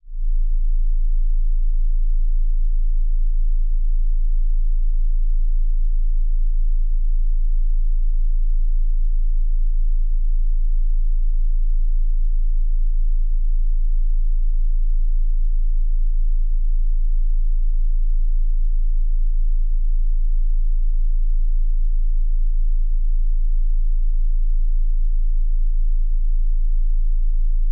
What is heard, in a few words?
28Hz